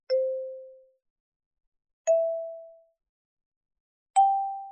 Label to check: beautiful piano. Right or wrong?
Wrong